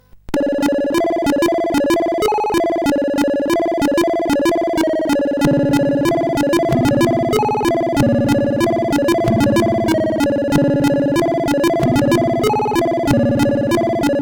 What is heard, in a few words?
Orchestral, CSG8580R5